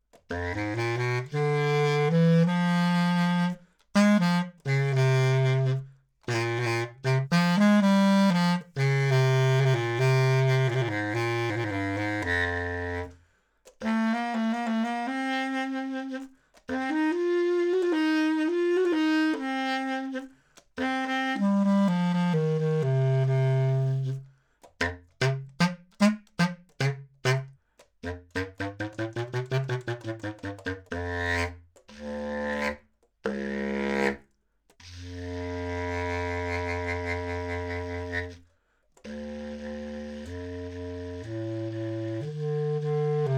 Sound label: Clar Bass; 20cm; High Mid